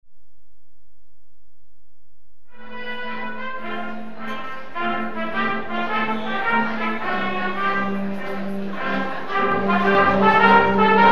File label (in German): Brasilien Hymne